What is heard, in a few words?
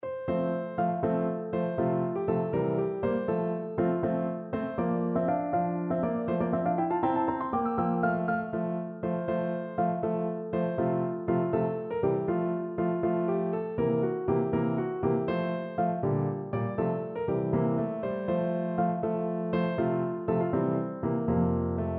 piano